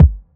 clean bass drum single shot d key

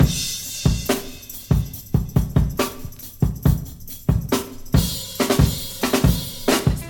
70 bpm modern drum loop C# key

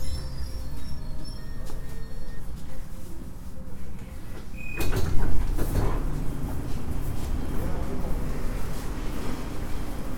subway doors opening - C Db E

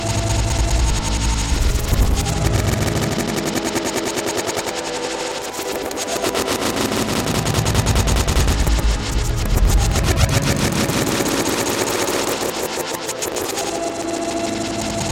beach fractured texture boards

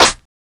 verby acoustic snare sound f sharp key; Mono

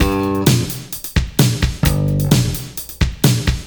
131 bpm breakbeat sample g key; drum loop

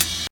mellow open hi hat one shot g sharp key

